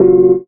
Note, Instruments, Keys; Jungle; Zero-G Jungle Warfare 1, 2 & 3; 90 s Sample CDs

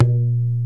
SARANGI TAB

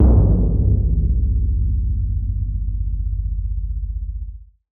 Percussive Stab

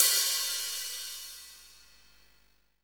hat rock, Hi-Hats; Roland L-CDX-01; HH Modules

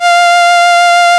SYN Handy Strng25; Best Service Gigapack 1

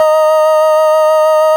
FARF, FARFISA

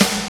SNR BEND, Snares; Roland - Rhythm Section; Snares 5

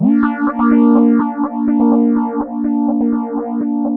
Analog Rhythm

